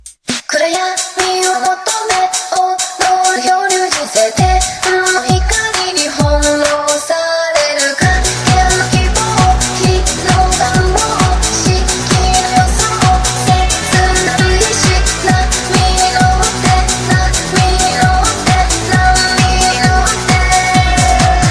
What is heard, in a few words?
Darkwave